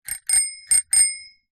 transport bicycle bell ring

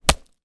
industrial axe hit tree stump; zapsplat

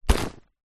cool bag fabric grab with hand

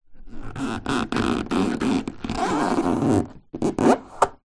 wood squeak polished floor boards hand; foley

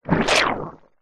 cartoon splat squelch wet; zapsplat

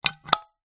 household thermos flask lid button push down open

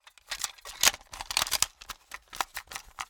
Cassette Tape Box Handling

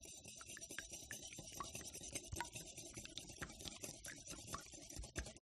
glitched tones eggs whisk bowl

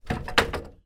household chair wood patio folded lean drop bang; zapsplat

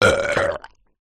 human burp soda

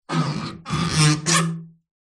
foley metal tap rub squeak resonate; zapsplat

